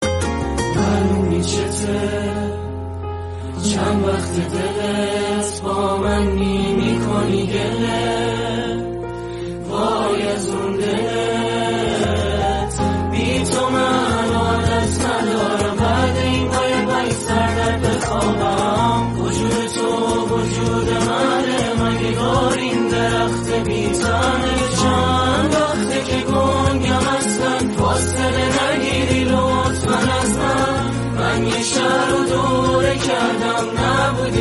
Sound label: Guitar